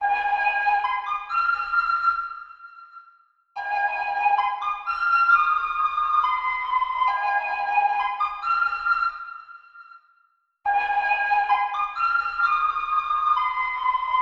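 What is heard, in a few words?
Dreamland Flute 135 bpm G major; New Nation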